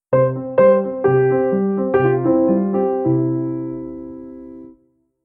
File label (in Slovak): klavir